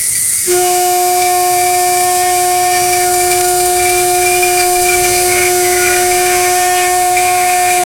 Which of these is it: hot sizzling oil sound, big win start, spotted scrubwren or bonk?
hot sizzling oil sound